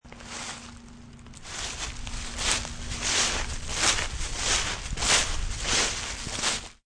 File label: Leaf Walk, Walking through rustling leaves.; Footsteps (Sound Effects:Human)